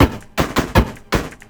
LOOP, 160 BPM, Drum & Bass

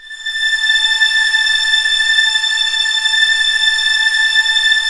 STR A5 FF, Orchestra; Sound On Sound - Peter Siedleczek's Orchestra

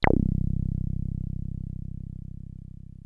303 C1, TB 303

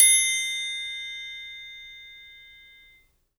TRIANGLE; E-MU Producer Series Vol. 7 – Old World Instruments

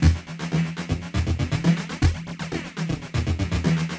Noise Beat; Drums & Drones

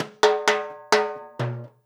FILL, DANZON, Percussion; InVision Interactive; Percussion Slam

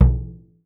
MD METAL, percussion; Voice Crystal - Steve Reid Definitive Percussion; BRAZIL SET

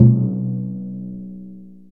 PRC TIMPAN, Timpani Dry; Roland LCDP03 Orchestral Perc

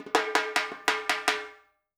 FILL, Percussion; InVision Interactive; Percussion Slam; PROGFROG

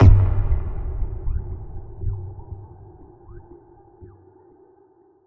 Impact; impact samples

